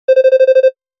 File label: Office Pulse Med, Business Rings